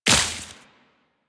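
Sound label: Step Vegetation, Footsteps, Walk; Warframe; Tenno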